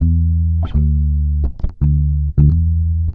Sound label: bass, Loops